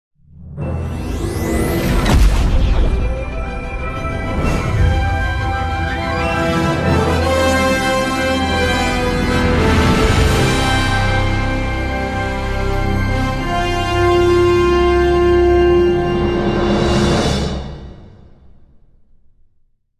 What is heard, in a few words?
star trek audio logo